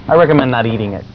eating

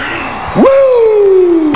woooooo

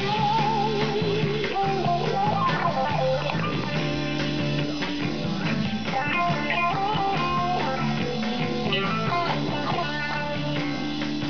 penguin solo

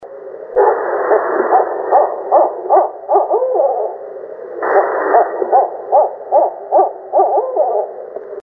BARRED OWL HOOT UP

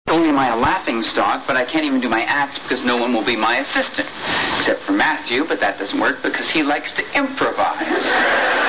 improvise

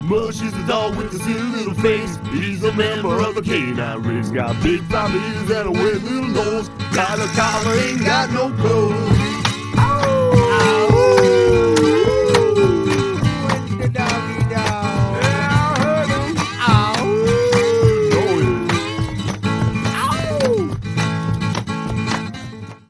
hoedown

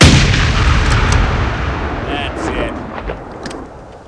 shotgun; Ash and shotgun -- (boom!) That's it.

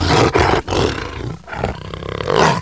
snarl

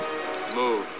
firestorm

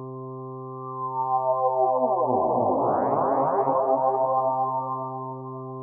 flange

